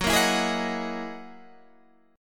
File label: F sharp 13th F sharp Guitar Standard; strummed; JGuitar